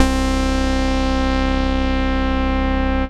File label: Synths Note C